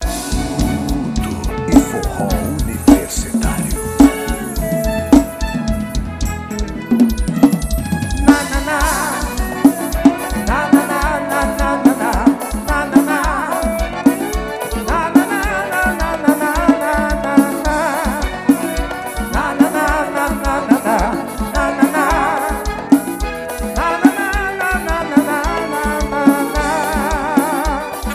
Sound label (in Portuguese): forro universitario; no rastape